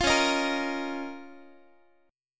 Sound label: Db 6th Add 9th Db down Guitar; Db6add9; strummed; 20,21,18,20,x,x